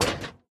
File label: Rocket Bounce; Doodle Jump 2